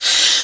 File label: BREATH, Female Vocals; PlatinumPhattCD2; E-MU Producer Series Vol. 8 – Platinum Phatt